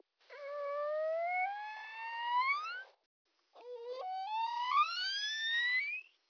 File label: without and with calf slowed 8 times